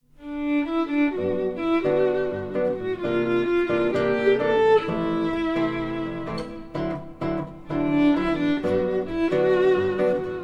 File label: Jazz, Guitar